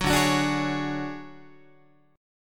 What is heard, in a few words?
D Major 7th Flat 5th D down Guitar 4A 4E 5A 5D 5G sharp 6C sharp; strummed; JGuitar